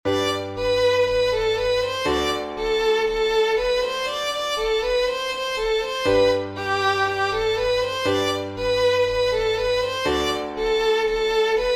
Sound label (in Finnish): polkka